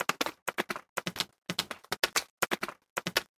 horse dash

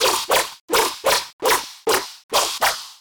katana continuity

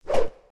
sword gesture

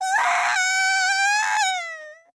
uwaan, girl

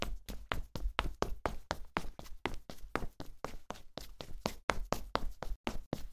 dash slipper

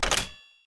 key in

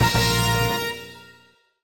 jajean

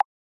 cursor, button

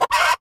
chicken cry

